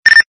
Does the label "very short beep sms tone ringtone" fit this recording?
Yes